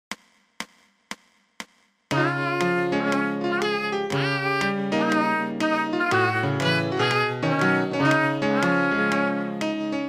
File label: one pitch